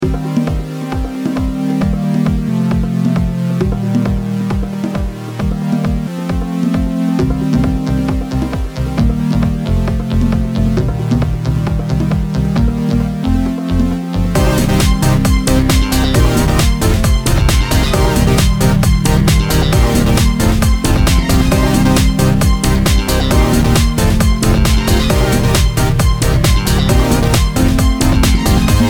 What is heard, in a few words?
Conga Beat